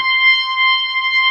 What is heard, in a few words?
B3 C 5, organ; AKAI S-Series CD-ROM Sound Library VOL-1